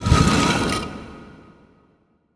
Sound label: bonus Coffin; netent